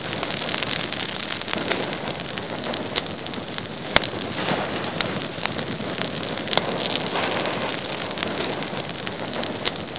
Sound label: burning skin